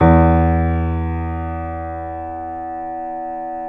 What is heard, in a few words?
PNO MF; Synclavier Sampler Library